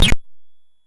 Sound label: MOS6581R2, sid; Future Knight